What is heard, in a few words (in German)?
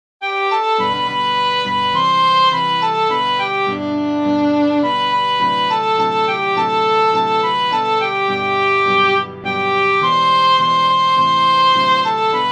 bitterkeit keyb; zuversicht